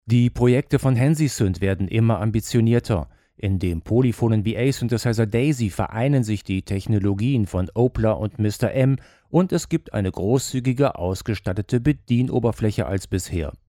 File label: Condenser EQ Sparkle mit HPF; Mackie DLZ Creator XS